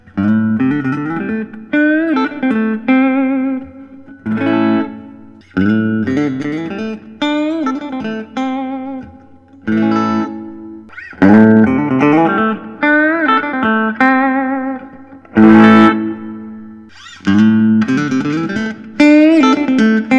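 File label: Parker Fly Classic guitar; 1998; pre ref trans cherry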